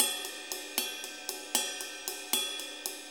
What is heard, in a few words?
6-8 Ride, HiHat & Ride Loops